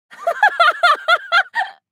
Young woman laughing

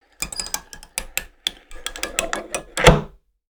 Heavy complex lock rotating mechanism; locks keys

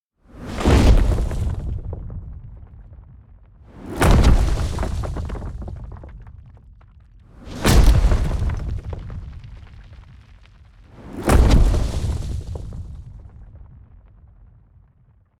Dragon footsteps ground shaking loop

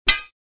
Two swords clash together single strike

